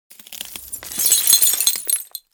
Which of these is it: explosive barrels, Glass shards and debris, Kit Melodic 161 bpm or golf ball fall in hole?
Glass shards and debris